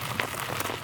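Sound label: bike crash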